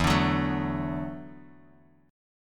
E 7th Suspended 2nd Suspended 4th E down Bass Standard; strummed; chord; JGuitar